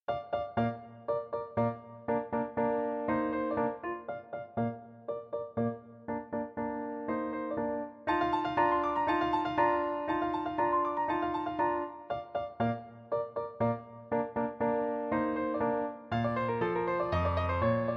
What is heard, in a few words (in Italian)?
Rondo per fortepiano. Allegro; Schmittbaur